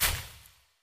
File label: win Symbol Flash; fairyhansel